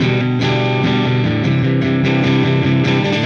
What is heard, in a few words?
Silos Dirty Strum Guitar, Indie Rock; Sonic Foundry (Sony Creative Software); Indie Rock Anthems; Session 11 - Silos